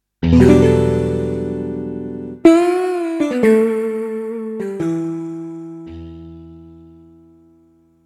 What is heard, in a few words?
Synth strings